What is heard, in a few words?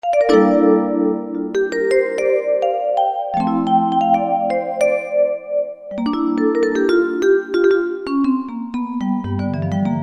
Roland JV-2080 Warm Vibes